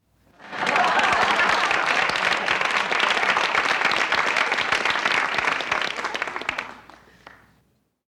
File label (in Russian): zvuki zhenskogo smekha